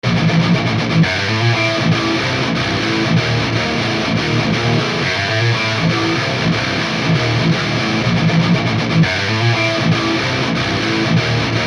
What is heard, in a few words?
MESA DR MARSHALL CAB